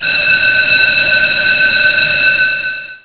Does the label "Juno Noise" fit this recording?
No